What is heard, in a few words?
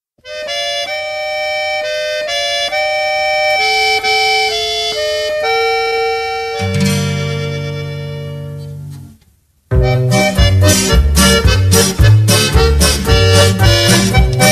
Ukrainian Folk Song; Хай живе, вільна Україна (Long live, free Ukraine!)